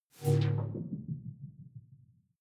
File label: sci-fi critical bell filter